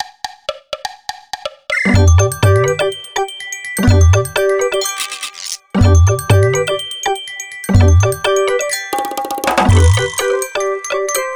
Toy Interest, ringtones; Honor; Magic-8-Pro

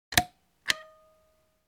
Power Down; LG; KF390

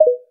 Volume Down, ui; Meitu V4s

